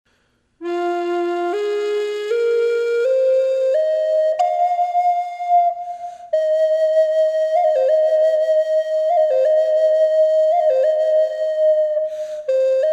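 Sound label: reverb, flute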